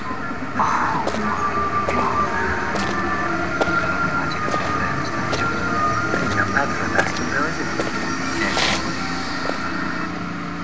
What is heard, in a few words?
footstep